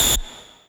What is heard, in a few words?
lead a